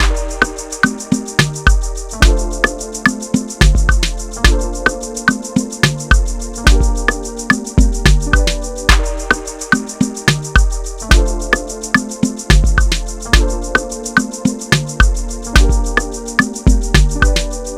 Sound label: Jazzy Harmony Example 108 bpm